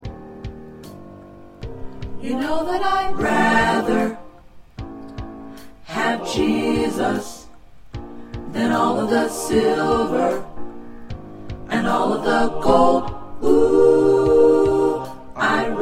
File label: SATB